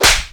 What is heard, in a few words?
charm; jinxie